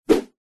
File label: foley whoosh whip swoosh fast; zapsplat